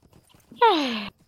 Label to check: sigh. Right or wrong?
Right